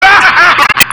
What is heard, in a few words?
bahaheh